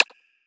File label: sliderbar; osu; Abstract